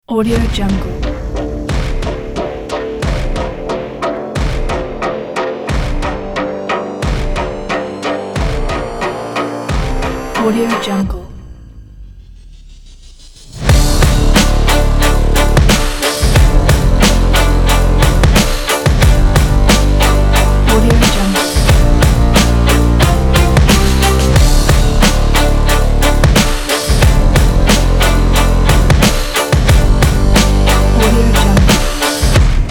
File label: powerful epic hiphop sport trailer